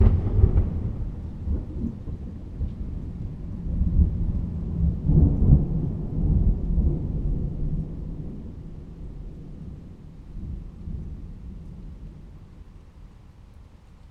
Deep Thunder Clap

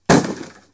Breaking Through A Wooden Door

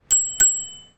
Bike Horn

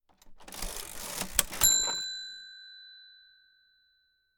Open

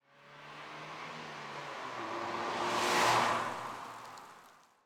Car Passing

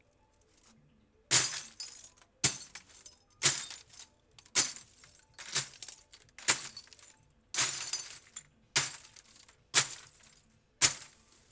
Crushed Glass

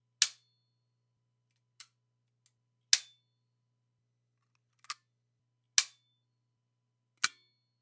Staple gun

